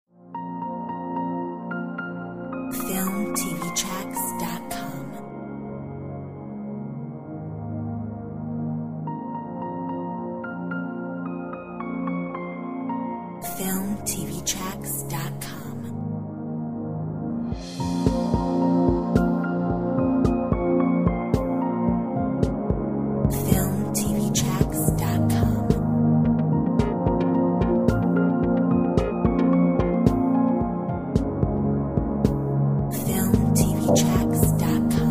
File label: Waiting in silence